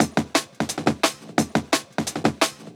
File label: DNB BREAK